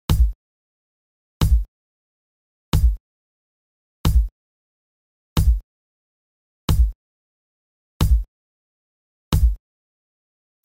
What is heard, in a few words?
Kick